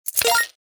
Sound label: Slot machine credit insert game play